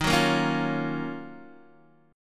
Eb Minor 9th, down, Guitar, DADGAD; Ebm9; strummed; JGuitar

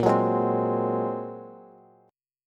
Bb 7th Suspended 2nd Sharp 5th Bb, Bass Standard; Bb7sus2#5; strummed; 18,21,18,17; JGuitar

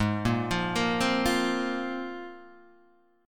C 7th Suspended 4th Sharp 5th

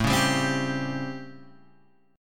A 7th Sharp 9th, Guitar, Open G

